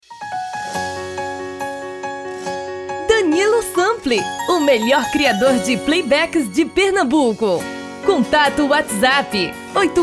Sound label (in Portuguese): Arrocha